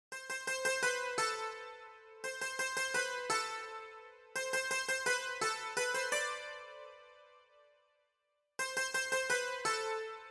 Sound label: Dulcimer; La Nouba